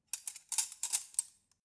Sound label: cutlery forks handling short